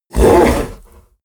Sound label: fishman groan short; kreaturen